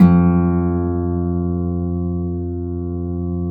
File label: F1 HARP; USB Soundscan vol.62; AKAI